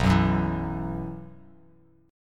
B Suspended 4th, Bass Standard; Bsus4 strummed; JGuitar

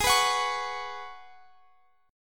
G sharp Minor 11th, Guitar Open F; strummed; x,x,20,18,21,20; G#m11

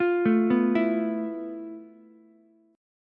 F Major 7th Flat 5th Ukulele; arpeggiated; JGuitar

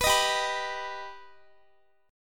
G Augmented G Guitar; strummed

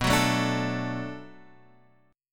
B-Minor 7th B down Guitar Drop D

